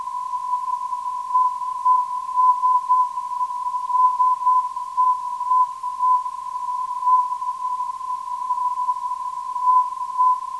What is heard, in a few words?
417kHz, ndb; EES-SEE